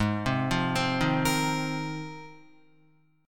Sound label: Ab 6th Add 9th Ab, Guitar; 3,3,5,5,3,6; Ab6add9 arpeggiated